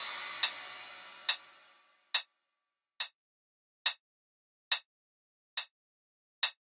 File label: Riddim Drum Loop 140 Top